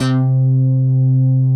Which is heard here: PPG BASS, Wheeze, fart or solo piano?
PPG BASS